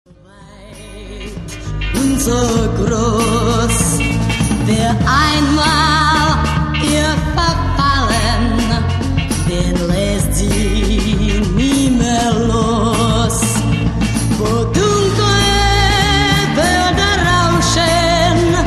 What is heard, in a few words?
tajga blues; taiga blues